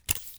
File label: injector injection; Escape from Tarkov